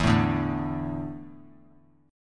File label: E 7th Suspended 4th; E7sus4 strummed; JGuitar